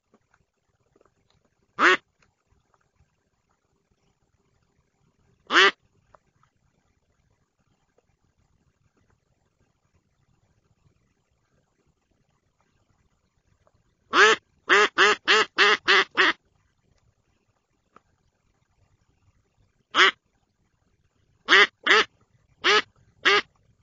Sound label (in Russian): Утка